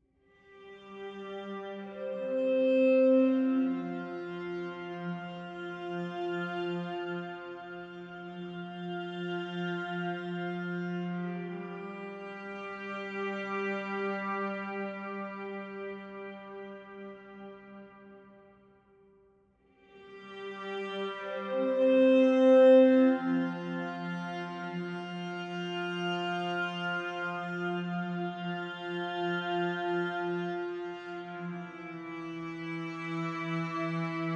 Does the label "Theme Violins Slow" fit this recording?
Yes